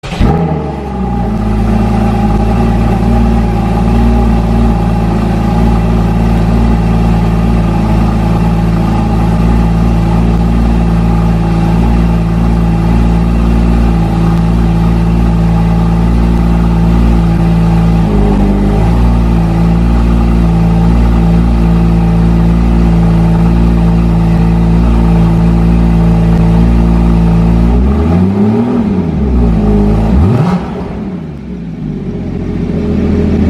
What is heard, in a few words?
cayman gts startup